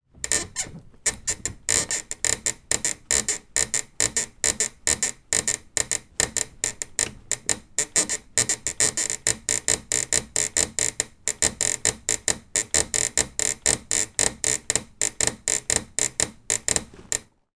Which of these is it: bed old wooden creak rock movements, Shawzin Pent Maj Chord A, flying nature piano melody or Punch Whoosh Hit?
bed old wooden creak rock movements